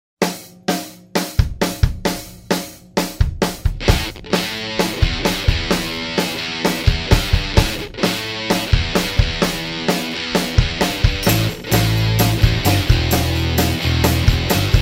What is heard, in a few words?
60's Rock; Soundzabound Volume 5